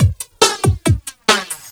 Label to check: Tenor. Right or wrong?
Wrong